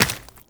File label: Run Flesh, Footsteps; Warframe; Tenno